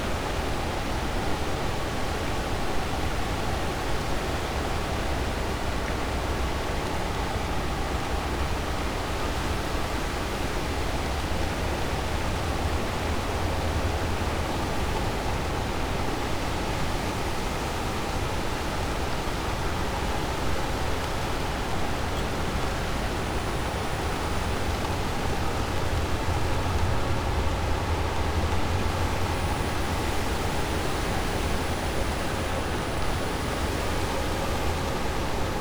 cachalot negatif, Physeter Macrocephalus

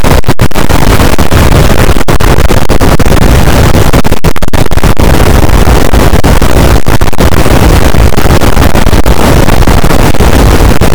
cachalot negatif, boat, Physeter Macrocephalus

